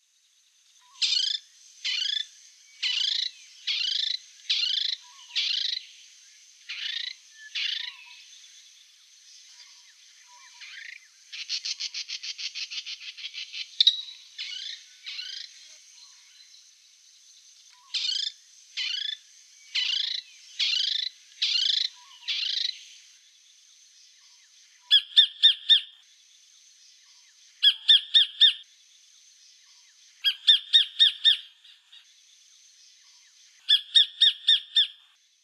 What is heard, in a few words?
sacred kingfisher; natural environment